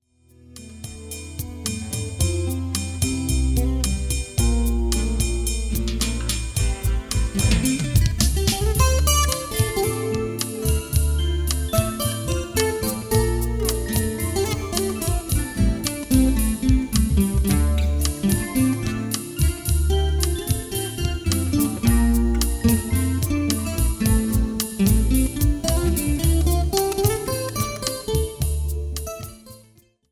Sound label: Acoustic